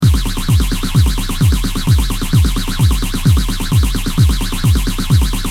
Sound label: drum loop Mutron phasor II